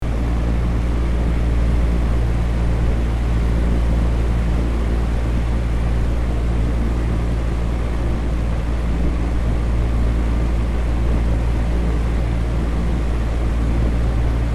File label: an oscillating fan